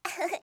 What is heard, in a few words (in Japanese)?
"ufufu", kanjou